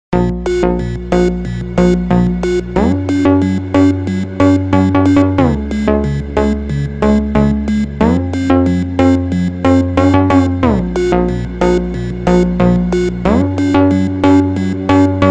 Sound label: super slowed reverb